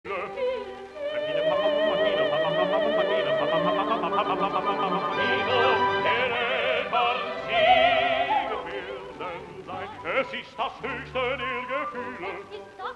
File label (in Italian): flauto - duetto finale - pa pa pa